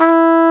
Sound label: SOFT FLUG E3, horns; AKAI S-Series CD-ROM Sound Library VOL-2